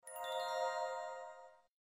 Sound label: Unlock, ui